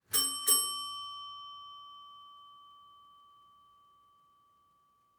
Hotel Bell on Reception with room ambience

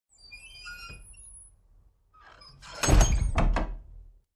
Creaky Metal Door

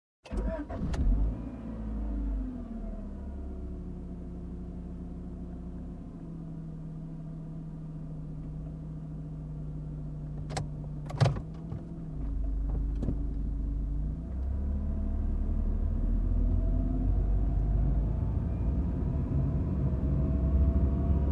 Calm car ride

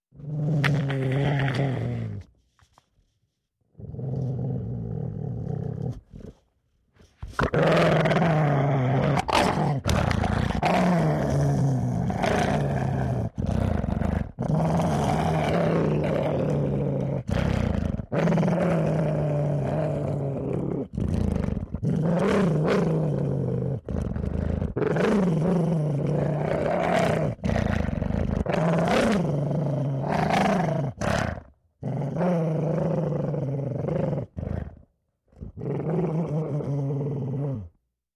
Wolf growling menacingly

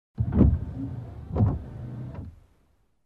Car Windshield Wiper

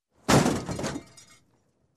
Car Bump with Debris Fallen Sound

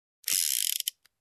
Turning revolver cylinder

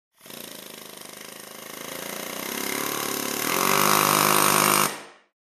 Motor Engine noise

